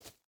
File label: footstep burlap dirt jump start; Rust